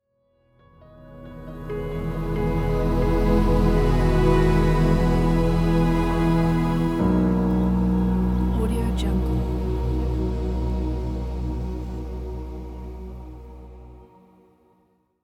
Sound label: relaxing piano logo